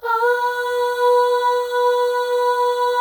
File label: AHS, FEM; Spectrasonics; FEM.2 AHS